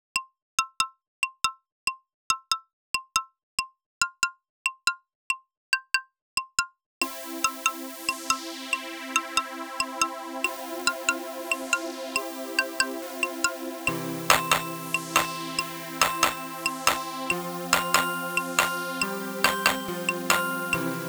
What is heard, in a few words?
SYNTH